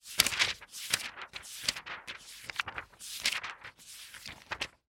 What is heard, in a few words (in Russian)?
bystro ishhut nuzhnuju stranicu v knige